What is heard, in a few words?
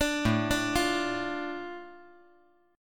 A Suspended 4th Sharp 5th, A